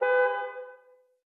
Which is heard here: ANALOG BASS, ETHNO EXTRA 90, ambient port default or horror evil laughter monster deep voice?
ambient port default